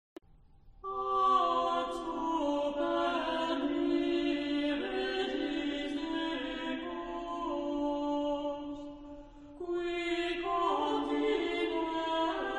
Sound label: discantus; ad superni regis decus; saint martial